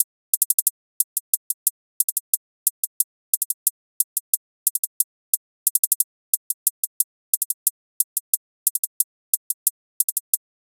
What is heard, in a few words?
Hihat Loop 90 BPM; Angels; Cymatics; ANALOG EVOLUTION Beta